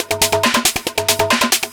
drm 138, Techno, drum-loops; Techno ID; franchise-loop

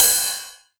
909 Ride; Novation Drumstation

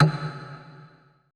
LOGDRUM E1 R; BPC DRUMS

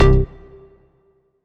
Dance Mega Synth; Best Service; 90 s Sample CDs

